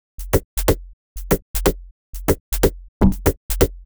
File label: Top 123bpm, House Drumloops; Gaza; Zero-G House Fabrik Drumloops